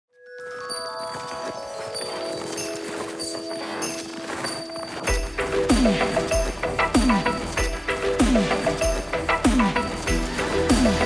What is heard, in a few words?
Jingle bells rap